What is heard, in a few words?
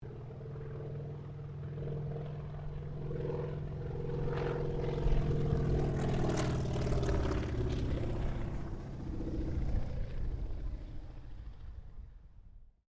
AIRCRAFT CAC WIRRAWAY PASS; CA-16 Wirraway; Airplane; Airplanes; Vehicles